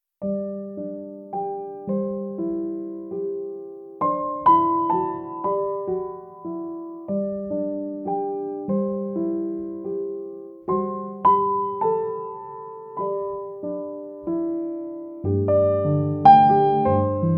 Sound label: my piano